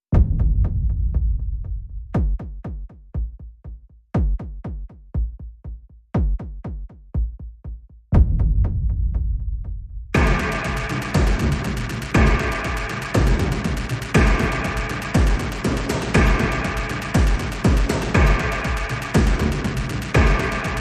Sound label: Perc Only